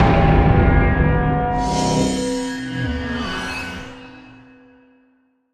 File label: Victory Banner Appear; Dead Star; PlayStation 4